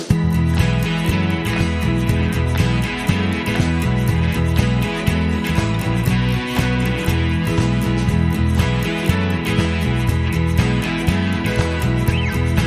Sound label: Inst